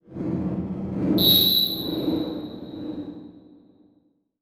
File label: Transition Sweep Drone; Production Element